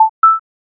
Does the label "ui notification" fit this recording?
Yes